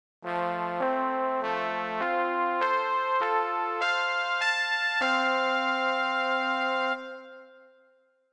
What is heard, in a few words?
trumpet